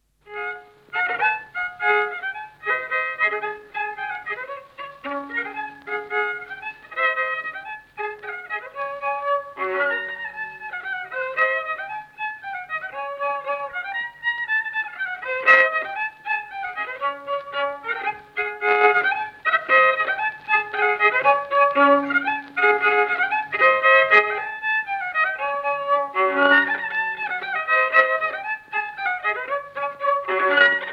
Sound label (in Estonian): Polka